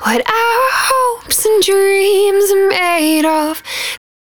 110bpm Ab, Velvet Vocal; Zero-G

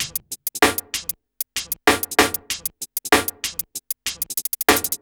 beat, Hip Hop R n B; USB Soundscan vol.19; AKAI

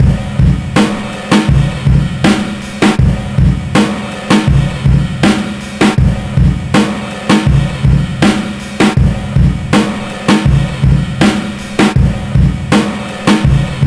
80 bpm, Breaks; LOVE 96; 90 s Sample CDs; Planet of the Breaks

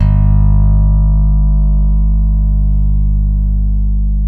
PRECISION BS, Bass, A 2; Spectrasonics; Bass Legends

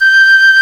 FIFE; Roland - LA Composer vol. 1; WOODWINDS

